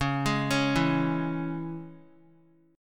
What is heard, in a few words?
Db Minor, Guitar; arpeggiated; JGuitar